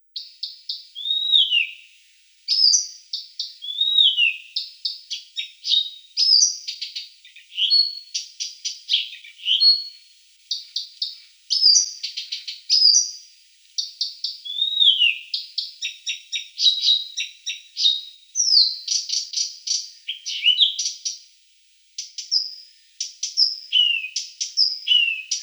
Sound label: Acrocephalus dumetorum